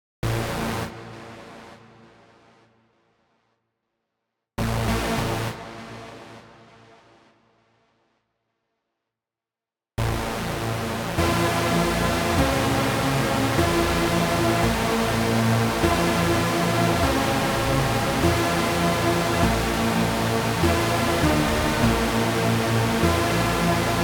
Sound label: Fingerpick Melody